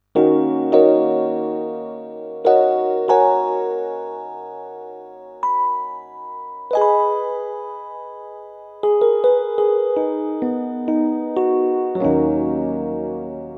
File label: Casio CDP-S360 Dynamic E-Piano